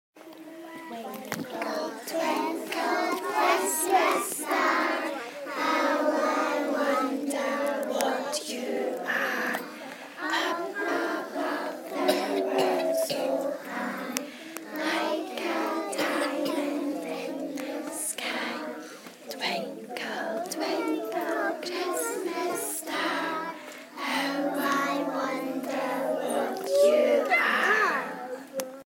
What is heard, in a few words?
twinkle twinkle christmas star